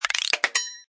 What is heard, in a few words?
Cartoon Click Ping, Funny ratchet, click and ping combo; Comedy/Cartoon